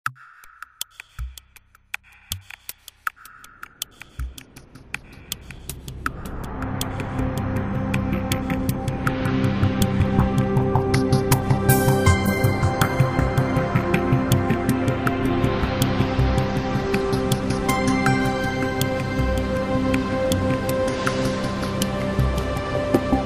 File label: Sea Life - Whale and Dolphi, Traditional Electronic Ambient